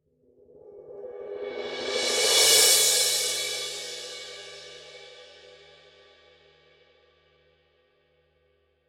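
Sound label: signature precision 18 thin crash roll, cymbales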